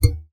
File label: Classical Guitar Extended Technique